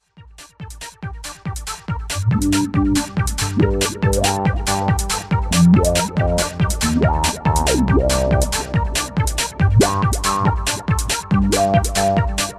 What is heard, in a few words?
drumstar